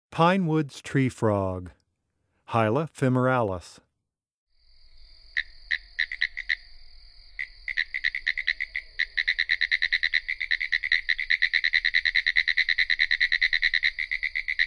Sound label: Pine Woods Treefrog Call